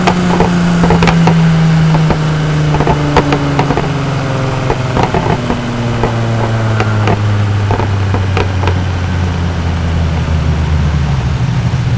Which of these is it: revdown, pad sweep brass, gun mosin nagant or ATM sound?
revdown